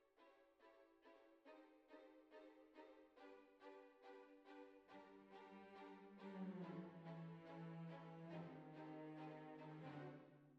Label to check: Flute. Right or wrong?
Right